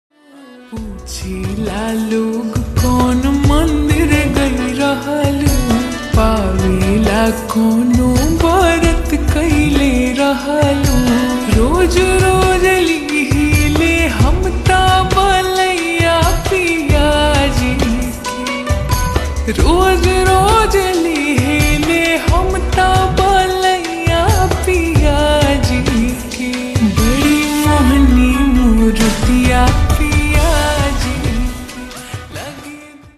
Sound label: Slowed Reverb Ringtone